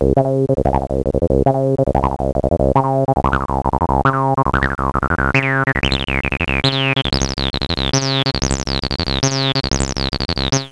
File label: freq high